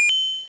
Coin

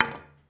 Wooden Hit

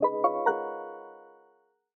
Task Successful

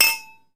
Ding

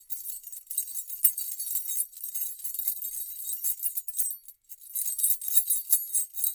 Jingles

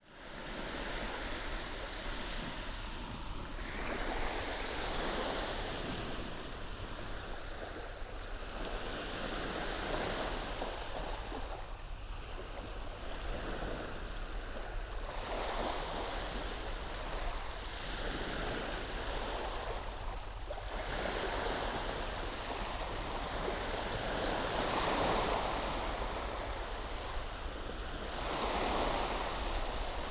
Beach Binaural

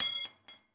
Steel Angle Ring Drop

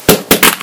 Broken Pieces Of A Thing Collapsing To Ground

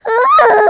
Screamy

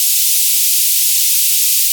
Bright White Noise

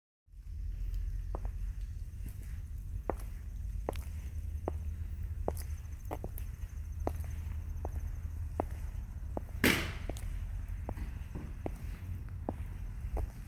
Steps On Pavement

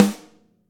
Snare

